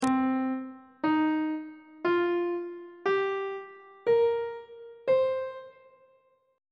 c minor pentatonic scale on treble clef

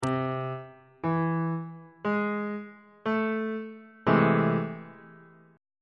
a minor major 7th 1st inversion on bass clef

